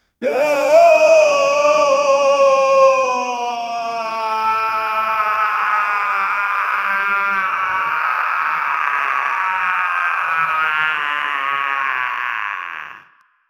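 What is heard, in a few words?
sinister infernal male laugh; evil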